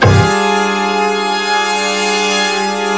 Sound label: G#3 ORCHS; Northstar; The Wizard VOL-1; ORCHESPHERE 2